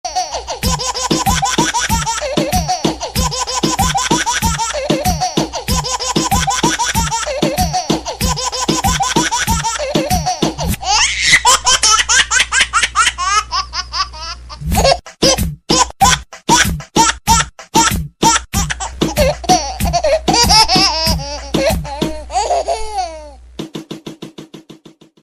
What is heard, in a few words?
Baby Laughing